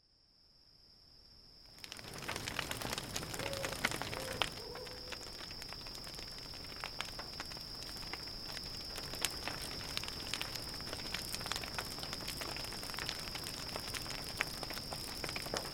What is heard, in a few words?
Ambient; Age of Empires III - Complete Collection; Microsoft Studios; cinematics